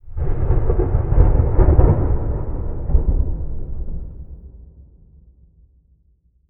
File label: thunder; AfterStory; Doki Doki Literature Club